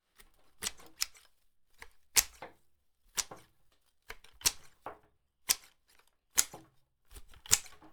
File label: Large Pruning Shears Cutting Branches; Handyman